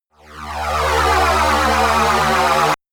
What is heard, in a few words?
shot uprising dark shine, games; pulsieren